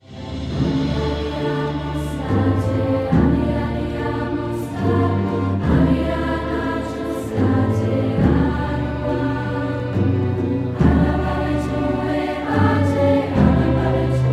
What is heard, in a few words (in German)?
Grosser Chor